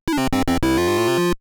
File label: GAME START; Mario Bros.; Atari 7800